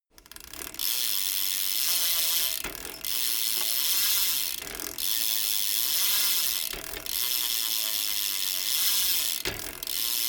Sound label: bmx bike pedal once freewheel